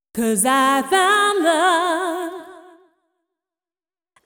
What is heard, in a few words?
deep house female, 125, A, vocals